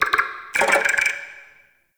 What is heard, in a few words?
Interior Spider Clicking; Duviri Murmur